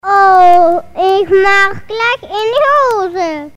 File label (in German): Jingels